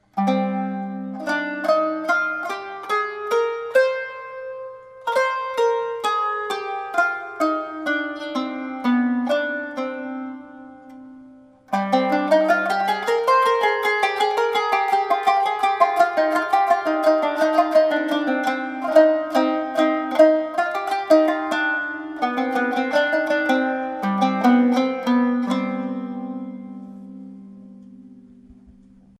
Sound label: minor natural